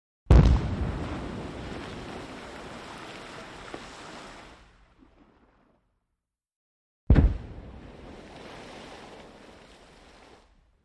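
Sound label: Underwater Explo Med, explosion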